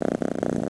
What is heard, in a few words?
purr